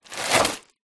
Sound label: food frozen peas bag take out of fridge; zapsplat